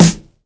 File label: juicy snare drum e key